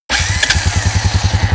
ATV Start; Vehicles

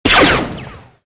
alt fire; weapons bryar

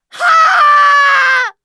KNOCK; True Crime Streets Of LA; XBOX

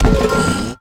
hubcap pickup; Cars; XBOX

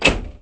door locked; Pirates Of The Caribbean

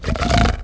roar

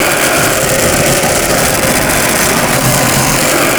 grind conc; terrains; Tony Hawk's Project 8; XBOX

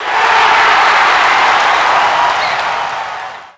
cheer; WhiteOut; XBOX; crater

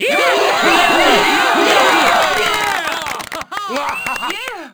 goal, Crowd German

